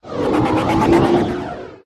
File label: atshi buff, pets